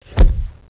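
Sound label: flush pot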